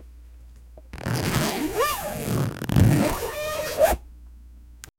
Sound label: a bag zip closing